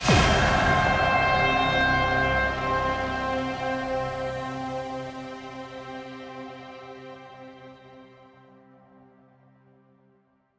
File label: Mus Multi Jingle; Chronicles of Riddick Assault on Dark Athena